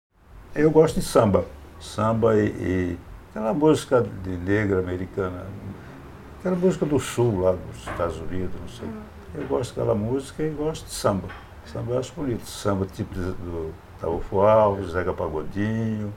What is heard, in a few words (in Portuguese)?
Gosta Samba